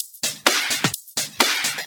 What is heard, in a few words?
House loops 128BPM; Vengeance Samples